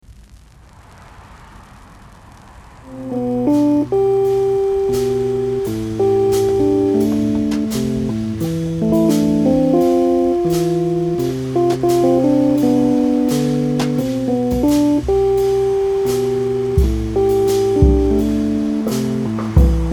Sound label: late night improvisation